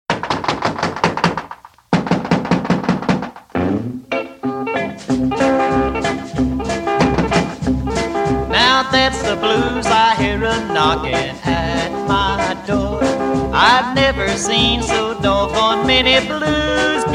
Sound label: Guitar; rockabilly; Rock’n’Roll